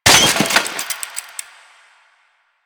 Bicycle Hit; Warframe; Ambience; Nineteen Ninety Nine

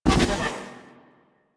Gun Beam End; Warframe; Thano Tech Arch Gun

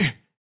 Pain; Envoy

